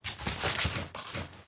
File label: Hands out loop